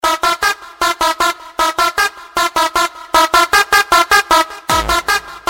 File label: horns tone